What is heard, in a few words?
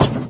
car hits tyre